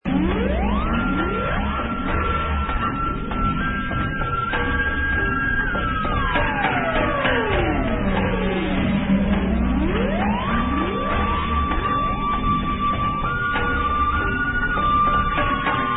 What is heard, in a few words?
bikelophone